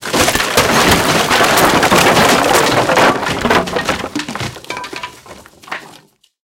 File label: wood building fall small; tanks; War Thunder